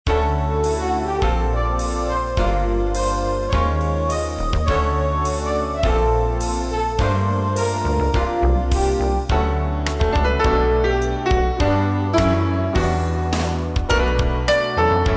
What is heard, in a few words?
light jazz